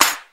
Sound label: old school rap snare f sharp key